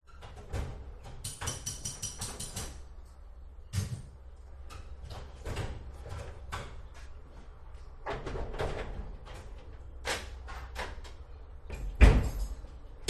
auto repair shop atmosphere metal tool clunks and hits; Sound Ex Machina